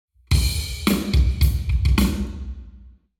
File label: Hall Drums 0deg; eMagLS